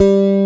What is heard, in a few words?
G2 STRAT, Bass Guitar; EL GT; AKAI S6000 CD-ROM - Volume 1